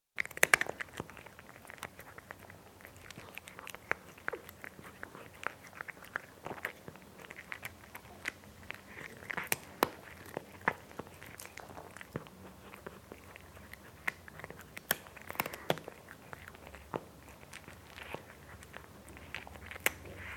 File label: Cat eating, nice crunchy sound; Cats; Animals; BBC